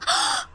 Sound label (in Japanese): はっ; ママになります